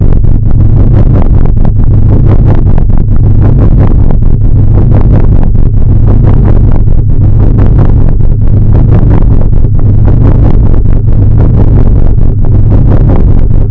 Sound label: pulses; cstrike; mujik; shadow